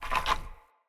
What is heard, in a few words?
Wood Stool Drag Scrape